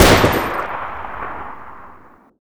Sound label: BAR shoot